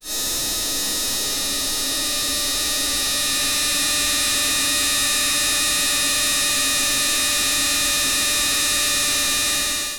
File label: electronics